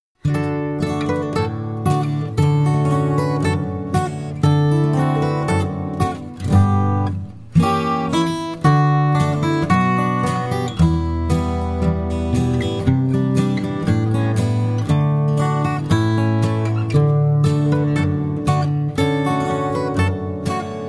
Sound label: Two Guitars; therapy